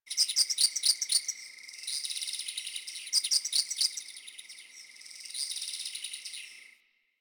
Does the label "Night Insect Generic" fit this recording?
Yes